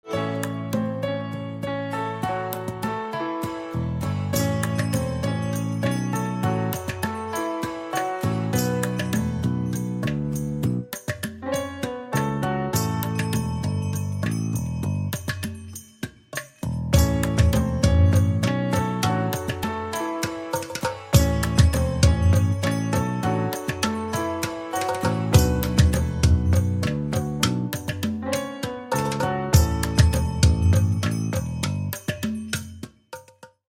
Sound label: Piano